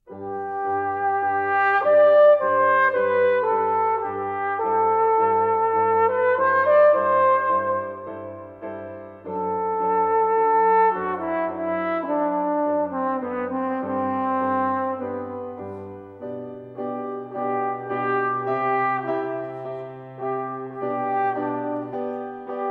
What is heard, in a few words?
concerto for alto trombone